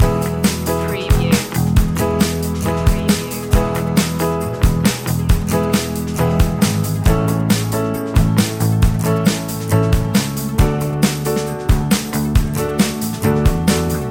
breezy inspiring loop

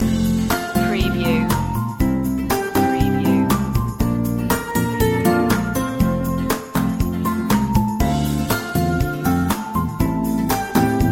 a cheerful morning loop

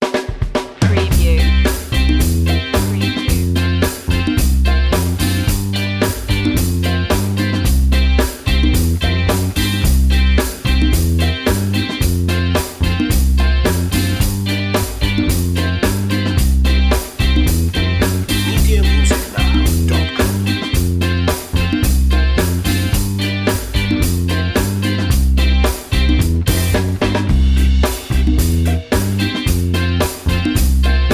beach fun underscore, caribbean; world influences

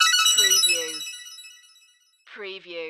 Multimedia Chime; Sound Effects Bible

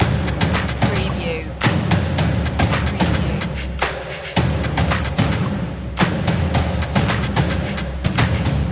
urban beat drums loop, stomp

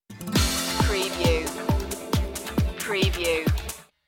stinger; smiling

